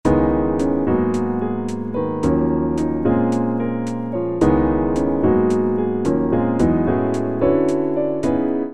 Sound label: Piano